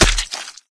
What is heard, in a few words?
body medium impact soft; source engine; left 4 dead 2